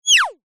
cartoon throw high pitched

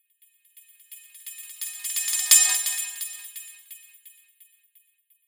sound design metal reversed delay shrill

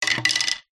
sport tennis racket down on concrete

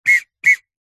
foley whistle toot blow; zapsplat

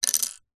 leisure lego piece single set down on wood table

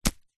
warfare knife stab into soil dirt single

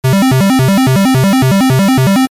arcade game alarm long

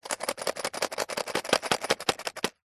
industrial box precision screwdrivers shake

